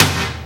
BIG TOM; Greytsounds VOL-1; SDX TOMS

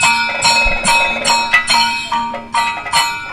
KATAK, Indian Traditions; USB Soundscan vol.45 - Indian Traditions; AKAI

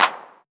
Old Skool House Clap

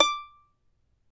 CHARANGO; Spectrasonics; Hans Zimmer Guitars VOL-2

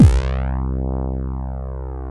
SAW BASS; MORPHEUS

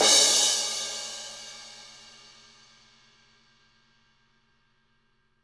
crash, crashes

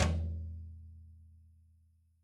Toms, Yamaha RY20

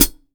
POWR HH, POWER DRUM; AKAI S6000 CD-ROM - Volume 1; DRUM RYTHM

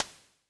Ana Sh Rim, Yamaha DTXpress-II snare

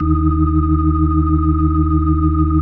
EB2F, B3

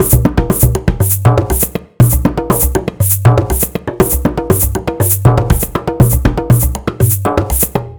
Conga Loop, Ethnic Instruments

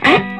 SOLO E, Vintage Blues Guitar; USB Soundscan vol.22

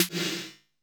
SFX S808; Masterbits - Soniq Elements

